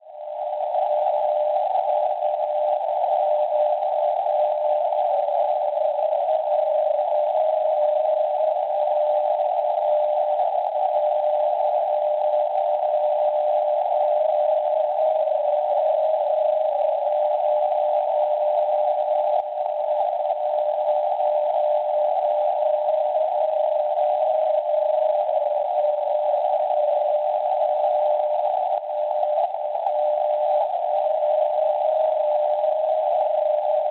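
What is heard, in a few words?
NDB non-directional beacon, 325 kHz; VG; Zagabria; Croazia; Schwarzsee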